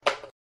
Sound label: staple gun; ion sound